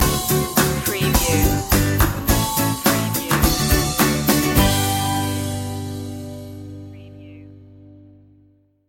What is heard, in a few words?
easy and fun stinger